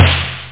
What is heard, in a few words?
fat snare